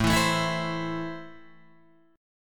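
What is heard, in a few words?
A Minor, Guitar DADGAD, chord strum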